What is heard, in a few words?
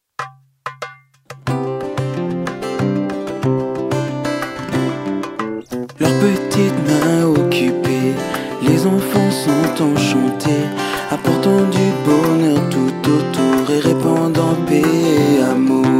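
Song, French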